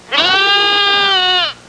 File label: Goat herd bleat